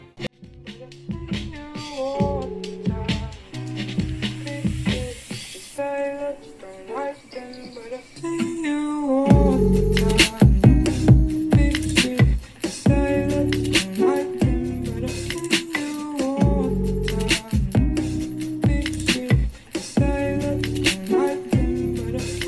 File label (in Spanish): Logitech z333 muestra audio; review